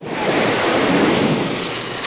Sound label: chem missile